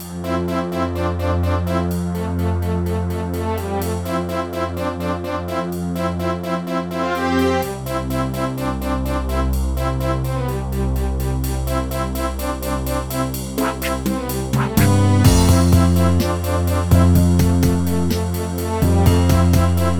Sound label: Music WIFI MENU; Super Scribblenauts 2010 NDS